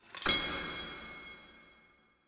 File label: ui clock